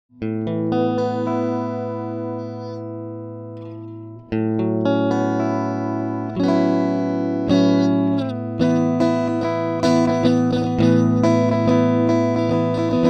goldmike mk2 E Git.

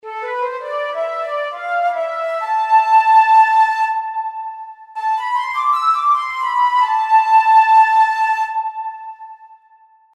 Flute und Flute 2

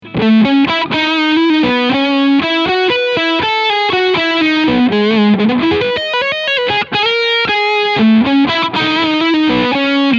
PRS Custom 24 10 Top Leadsound Steg Pickup

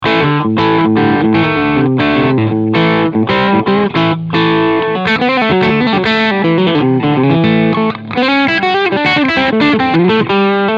Crunch beide Singlecoils

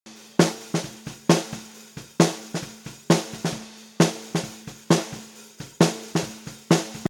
SN roh

